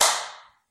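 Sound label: high five sounds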